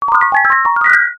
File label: magic, digital; Magic Spell; 1.2s